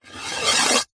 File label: sport snooker cue slide table edge; zapsplat